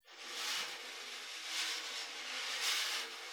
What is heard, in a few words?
Snare Drum